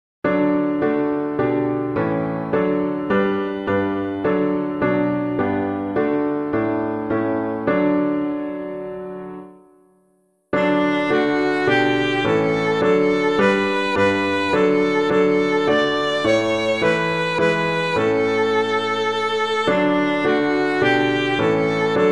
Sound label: piano